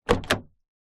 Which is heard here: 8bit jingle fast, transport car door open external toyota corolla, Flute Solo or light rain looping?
transport car door open external toyota corolla